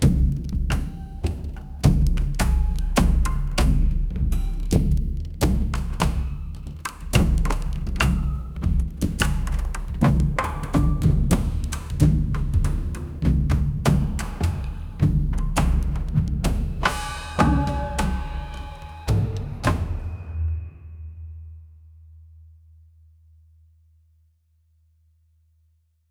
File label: rhythmic deep organic, drums